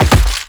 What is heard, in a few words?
Land Rock; Warframe; The New War; Kahl; Footsteps